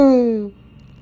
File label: pow; green meter